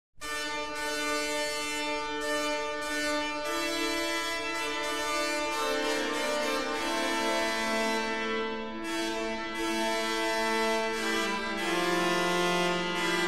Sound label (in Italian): Hymn Per Organo; musica antica; Dunstable; Agincourt